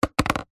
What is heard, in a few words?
household plastic disposable cup drop; zapsplat